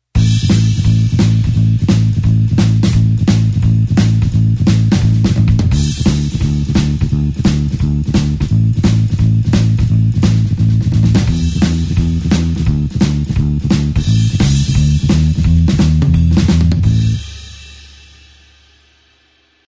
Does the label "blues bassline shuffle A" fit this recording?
Yes